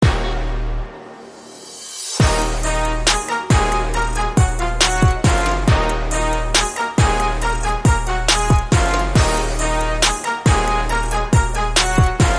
Trap

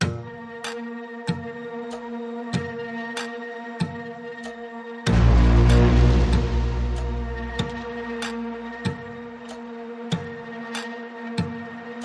Row Your Boat Horror, No Melody

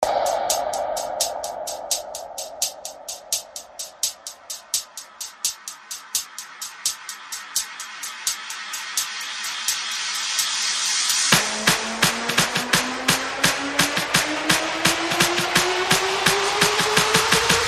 Hail Mary, Percussion Bass and Effects

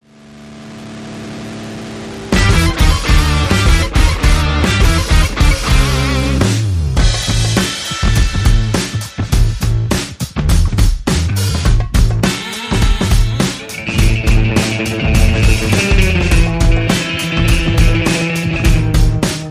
No Horns

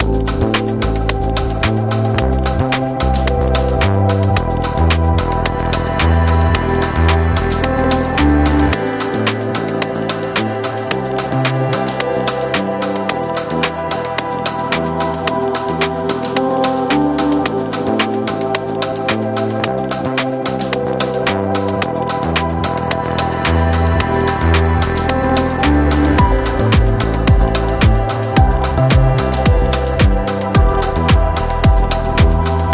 Alpine Ambience

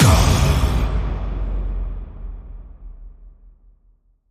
Vocal Fury Growler Hit

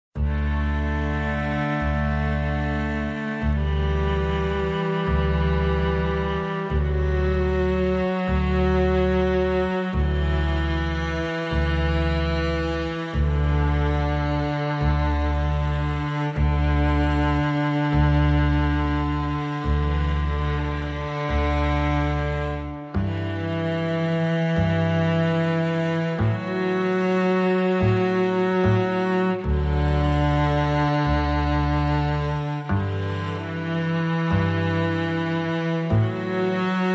Strings and Bass

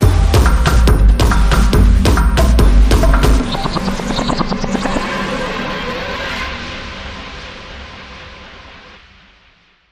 Dub, Sting

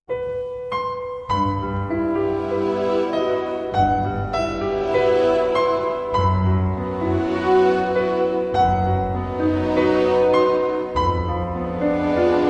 Melody With Piano

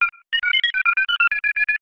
Phased Dialling Beeps

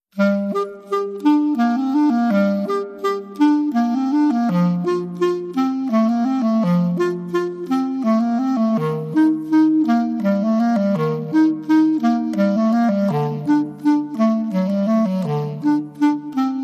Spanish Baroque

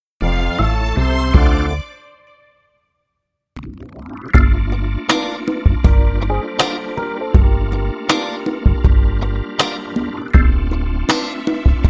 Underscore

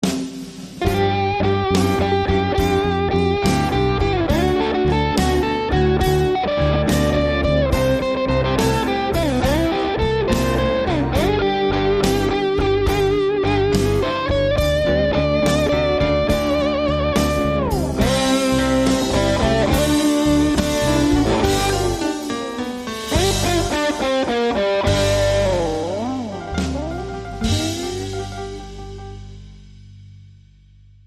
Blues

